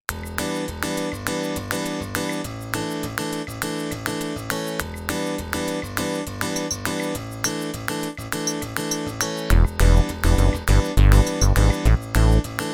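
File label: groove; sy85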